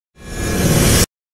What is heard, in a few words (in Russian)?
perehod kiberpank